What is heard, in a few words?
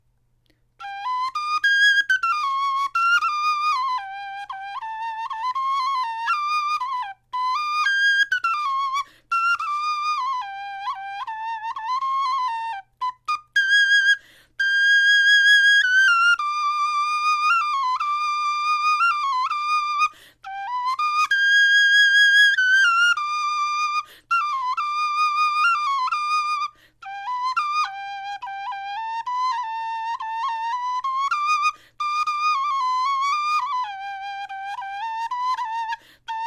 melodie, knochenfloeten